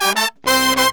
BRASS SAMBA; Best Service ProSamples vol.14; AKAI

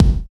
BD, BD Dance

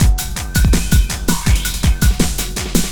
LOOP, Eurotech; Sounds Good

